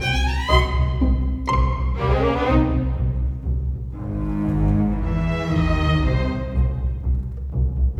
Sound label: Strings; Sonic Foundry (Sony Creative Software); Orchestral VOL-2; Modern 11